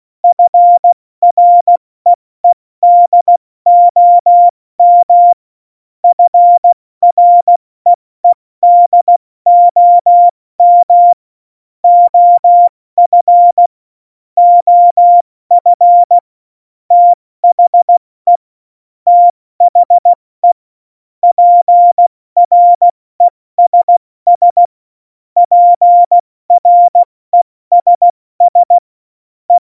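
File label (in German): Witz, Tempo 70, Morsekurs, cw morsen; Witze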